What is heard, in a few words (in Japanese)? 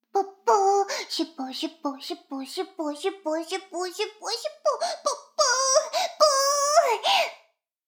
シコシコ音声; 逆時間停止されて